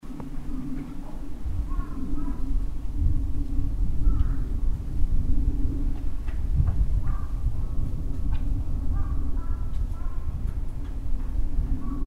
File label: airplane